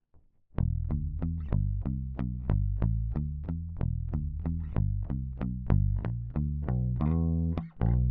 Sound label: Bass